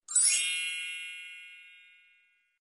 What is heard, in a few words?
stick sound notification